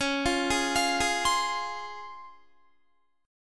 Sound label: C sharp Major 7th, Guitar; C#M7; arpeggiated